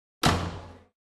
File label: door slam sound effect